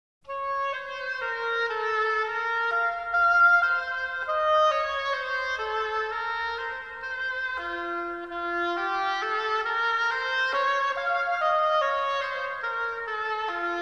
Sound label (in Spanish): Sonido de oboe; Instrumentos de viento; Viento-madera; oboes